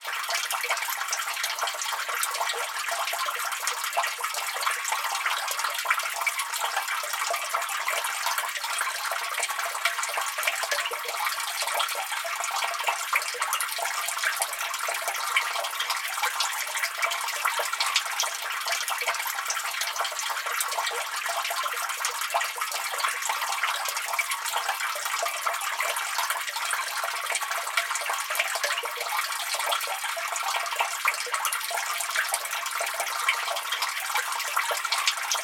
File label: nature water running down drain in road